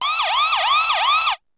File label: movement; soldats du feu